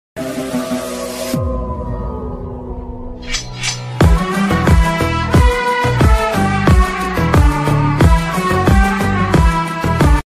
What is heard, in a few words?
ringtone turkish music turkish ringtone relaxing music; turkish attitude ringtone; audiozone vr